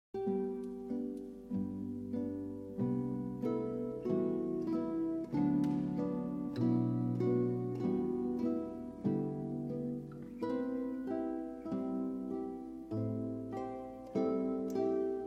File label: Guitar Quartet